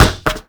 car tire bounce; Call of Duty Modern Warfare; physics

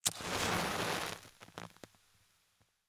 beancan grenade light fuse; Rust

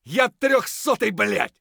hurt light; Escape from Tarkov

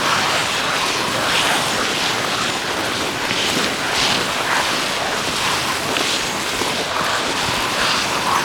snowmobile surface ice; snowmobile surface ice mono

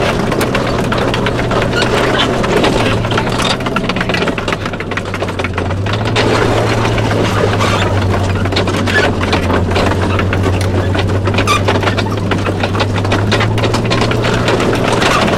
tracks speed, engines tanks; War Thunder

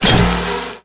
connect weak spot

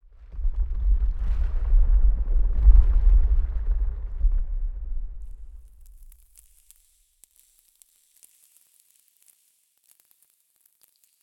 rock fall; Rust

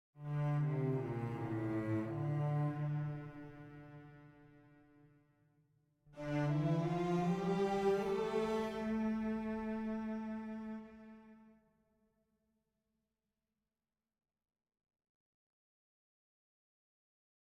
music interactive; World of Tanks

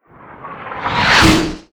artillery incoming; Call of Duty Modern Warfare

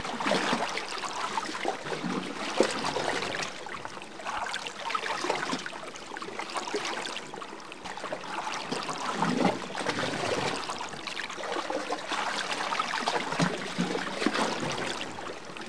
waves; World of Tanks Blitz; ambient milbase

